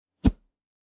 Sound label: Punch Swoosh Thud; Hit Whooshes